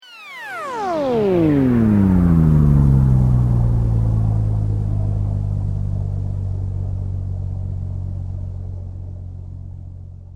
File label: Sweep Mechanical Slow; Created sweeps, swooshes and other movement effects; Sweeper; Sweepers (Sound Effects:Sound Design); SoundEffects-Foundation4